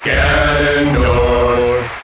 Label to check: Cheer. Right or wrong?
Right